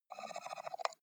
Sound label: computer mouse wheel scroll slow